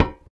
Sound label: solid metal, footsteps; player; Dystopia